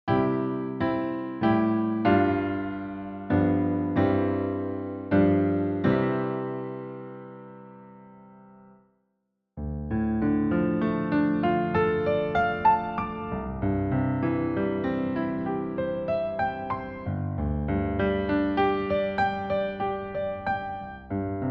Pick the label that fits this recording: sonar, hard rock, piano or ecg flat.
piano